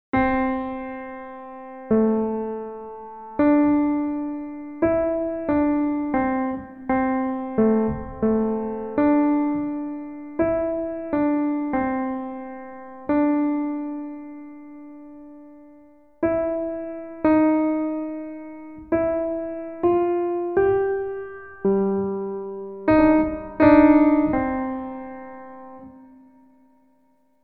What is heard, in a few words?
Jazz Walk